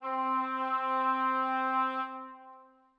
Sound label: oboe leg C4, Woodwinds; BBC